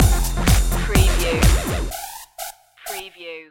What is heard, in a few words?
sexy step stinger